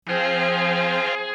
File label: Angry Strings, mellotron